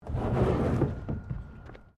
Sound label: PLASTIC BARRICADE SLIDE; Material components for constructing more complex sounds